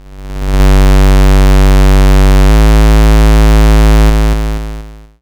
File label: YM Bass Gm, chip; ChipShop Deluxe; ChipShop Hits